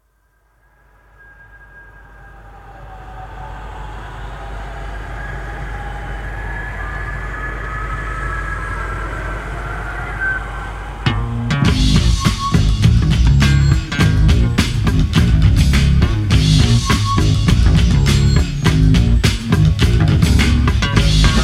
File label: Funk Free Bass